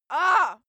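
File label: female pain, screams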